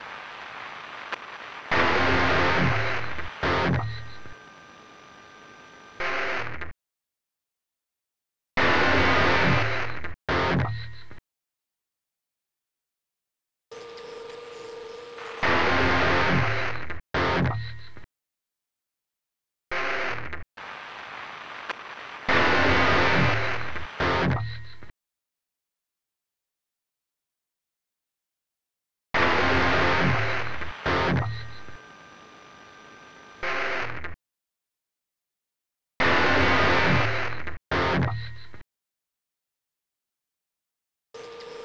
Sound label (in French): Guitare Satu